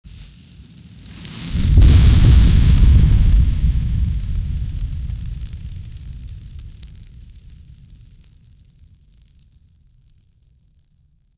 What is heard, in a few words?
fire, elements; dbz